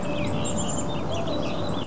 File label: birds